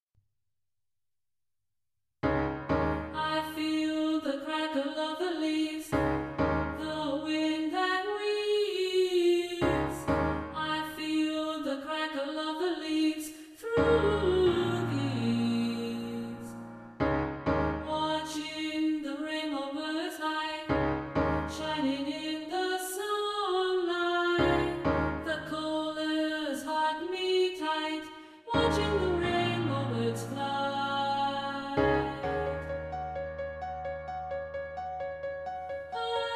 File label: Rain Chant